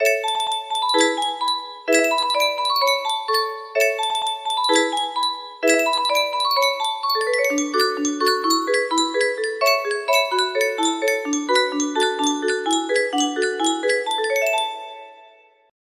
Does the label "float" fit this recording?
No